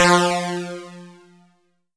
synth

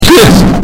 laugh distort

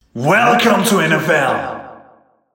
vokal

